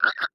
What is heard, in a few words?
Animal Frog California Tree Frog Chirp; animals; AdobeCC